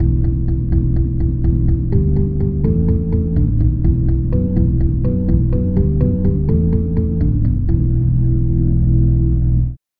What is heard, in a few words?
rock organ; bass